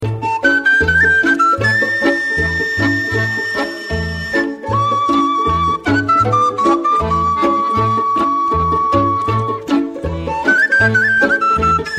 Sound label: romanian fluier